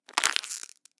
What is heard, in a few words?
Rubbery Plastic Crackle